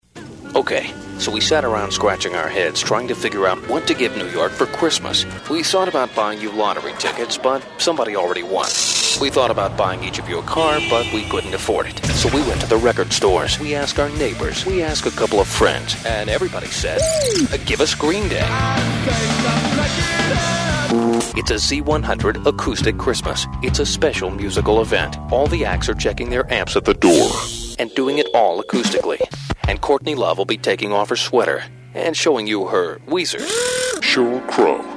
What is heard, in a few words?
Acoustic Christmas